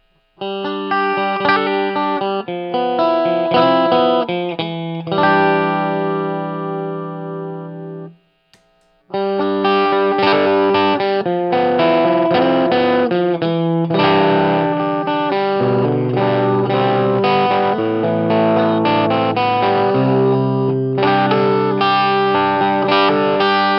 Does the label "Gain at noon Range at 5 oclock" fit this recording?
Yes